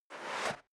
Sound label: step leather shoes sand scuff; schritte